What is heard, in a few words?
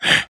breath, creature